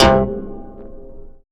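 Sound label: GUITAR TONE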